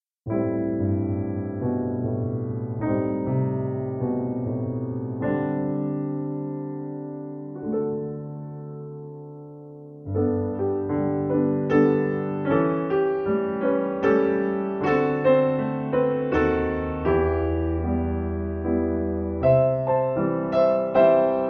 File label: battement fondu on a 3, a concert waltz; bethena; scott joplin